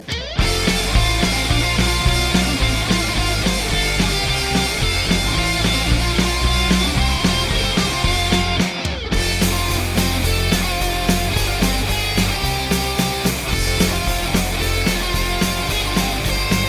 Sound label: rock version; super mario